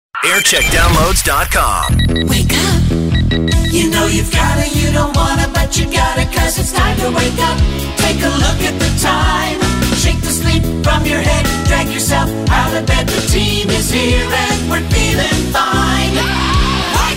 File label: Jingle Montage; Signal 2; JAM